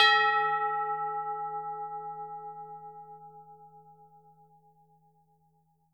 tubular bells hit E5; AO bell

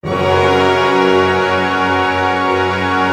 Pentatonic, Chord Cluster; ORC PENT; Roland L-CD702

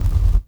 bass, 150 BPM; Ueberschall; Drum & Bass Resonance

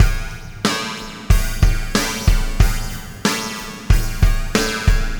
Robotic Beat; Processed Drumkits

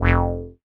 bass F1, ARP Odyssey

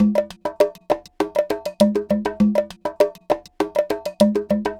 Conga Groove, Latin Percussion

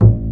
BASS A2; ORCH.COMP

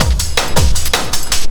LOOP L, Drum & Bass 160 BPM

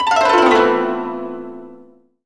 koto gliss; AKAI S-Series CD-ROM Sound Library VOL-7

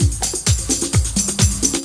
PERC PWR; Masterbits CLIMAX 10 - Add Lips Vocals

